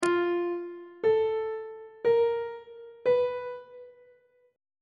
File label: e sharp 4th interval pitches on treble clef